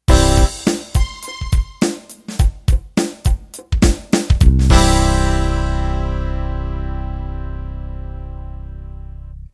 Pop Ballad End

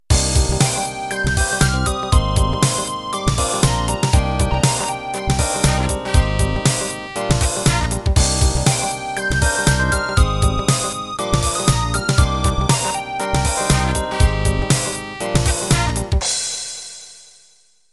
Hip Hop Intro

